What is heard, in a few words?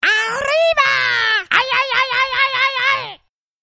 Arriibaa Ayayayay